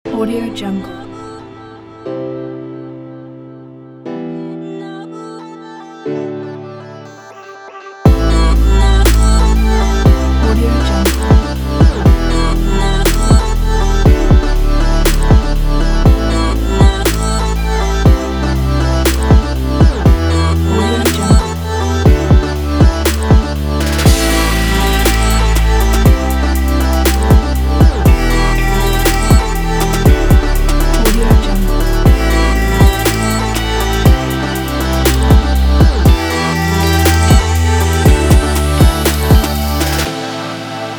future bass chillout

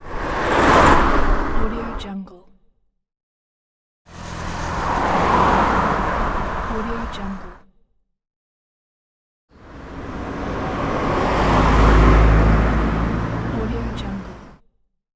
car passing

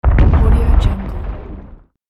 distant grenade explosion

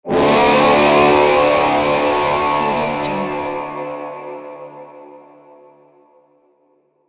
war horn

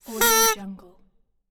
party horn

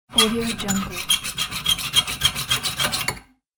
jail crate cutting by knife

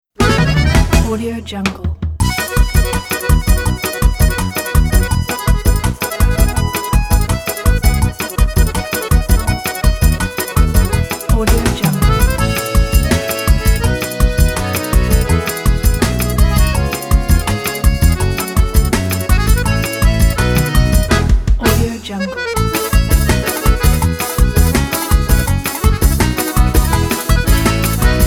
arrasta pe; flaviocortizo

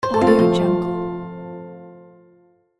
game answer right

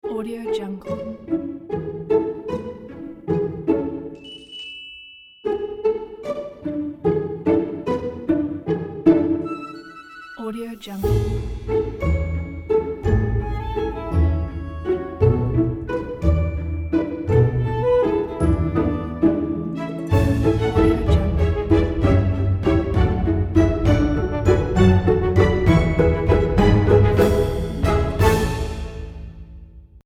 elegant wealthy vals

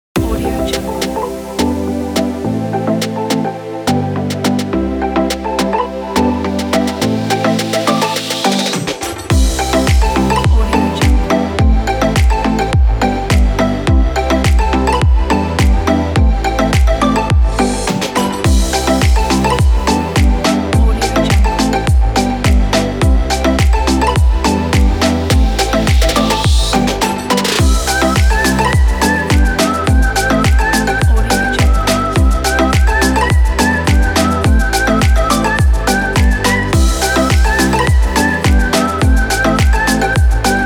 tropical house

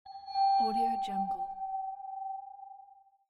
shimmering light

